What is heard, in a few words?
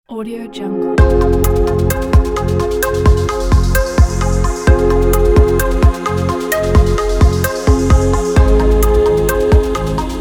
relaxing ambient tech